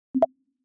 imac pong; Системные звуки Apple iMac и MacBook Pro и Air

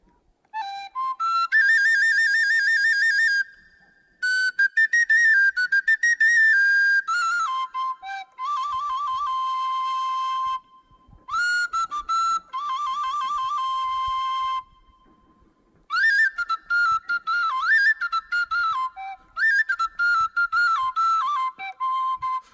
sopilka

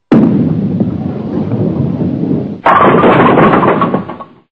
bowling

